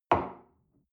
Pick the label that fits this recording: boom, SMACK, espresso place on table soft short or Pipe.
espresso place on table soft short